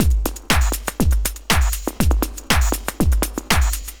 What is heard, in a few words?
Bonus Music; MexicanaChillitime; ClubFusion